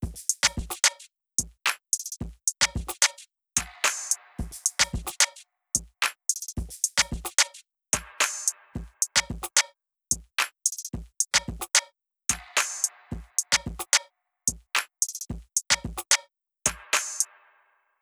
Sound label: Drums Noise; m4a 96kbps; Cymatics